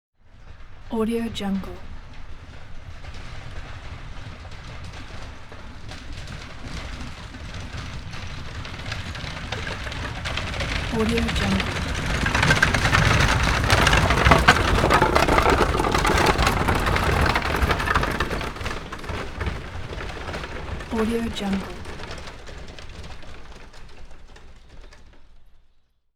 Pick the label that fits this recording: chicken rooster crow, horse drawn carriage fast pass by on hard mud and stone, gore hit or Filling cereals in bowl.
horse drawn carriage fast pass by on hard mud and stone